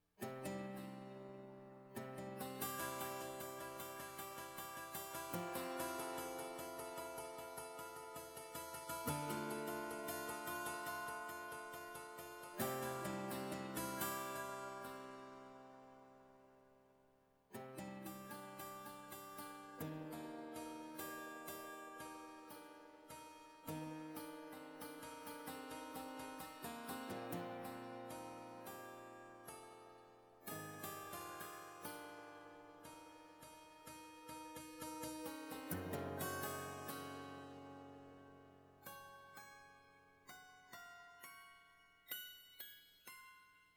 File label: guitar